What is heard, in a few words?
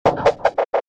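boomerang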